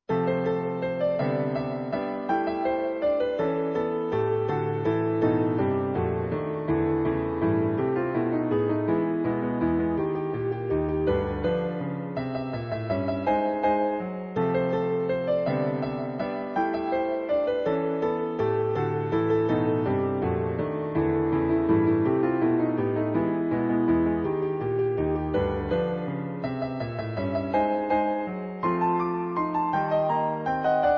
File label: Piano folk